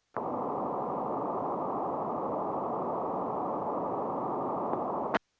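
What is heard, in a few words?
digital signal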